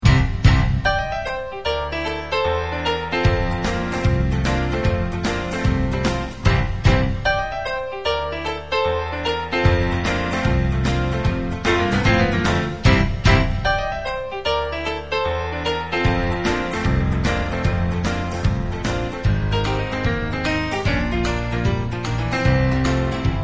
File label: country fade